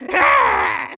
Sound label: Pack Rat Death